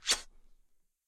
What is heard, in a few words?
kiss